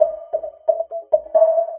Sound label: beat band m; DirectX SDK; DMusProd